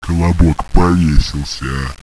zombie idle; boomario

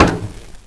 player ladder

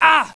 get hit; eqclassic

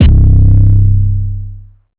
808 low; cymatics; sven

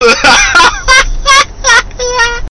hahahahaha

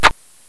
kiss

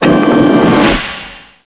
door move

